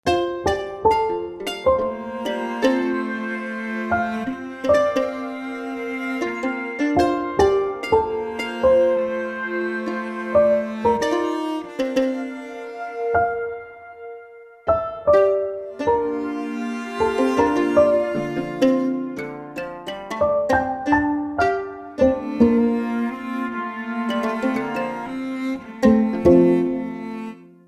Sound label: Lullaby